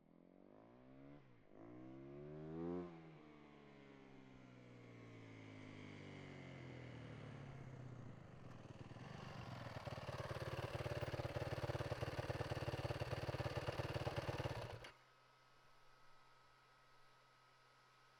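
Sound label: Yamaha, WR450F, Exterior, Fast, Various; Yamaha WR450F 2019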